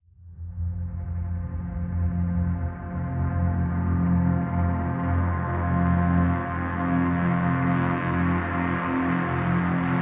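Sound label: Drone Fahrenheit F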